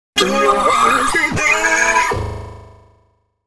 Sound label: female c#, Dance Ultra Voice FX; Mutekki Media